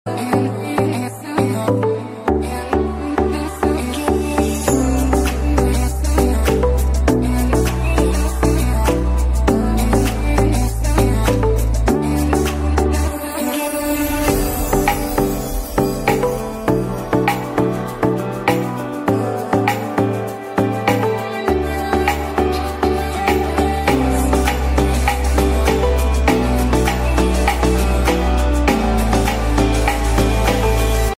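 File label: panjabi background music ringtone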